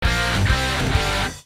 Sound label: Hard rock open; Nokia; audio themes